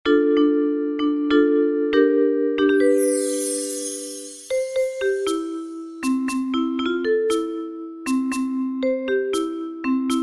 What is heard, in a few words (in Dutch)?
Onderwatermuziek